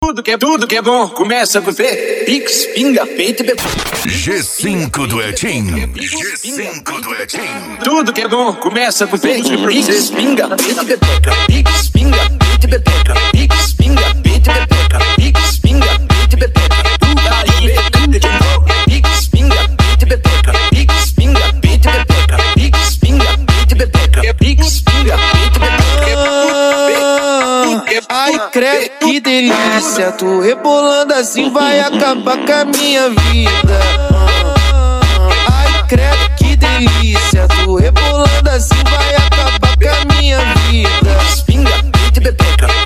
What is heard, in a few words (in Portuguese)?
mega funk e funk mega bass